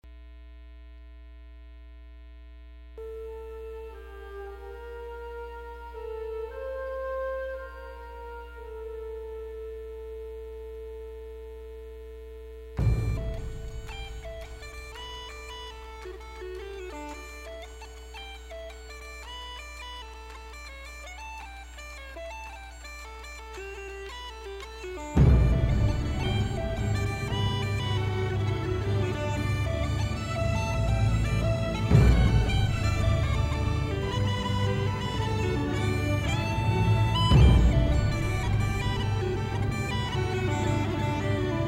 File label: Bag pipes